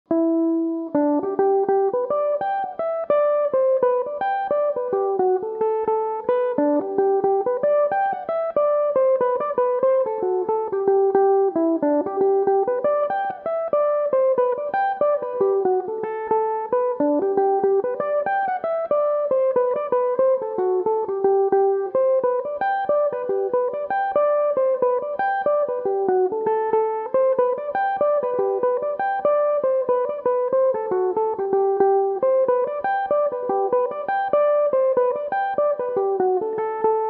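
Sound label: G major, mandolin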